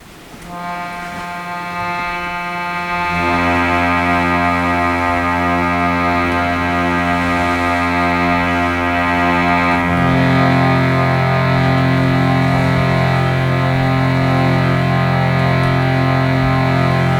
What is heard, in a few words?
seagull wings and ocean resonances; dronning island; sound healing; chanting